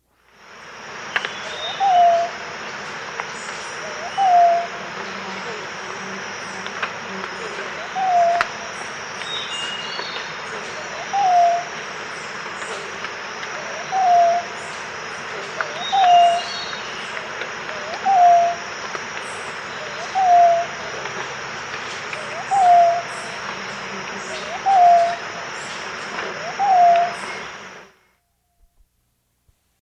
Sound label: Purplish-Backed Quail-Dove, S. America